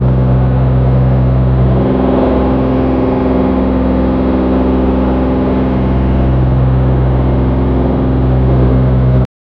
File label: low frequency avion engin, aircraft